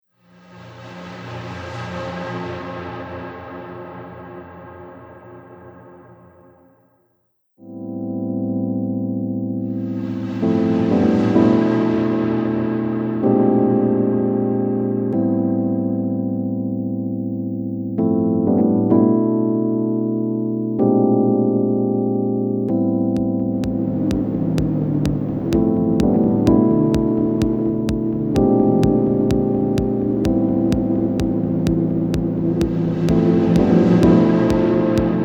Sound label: Northern Lights Soundscape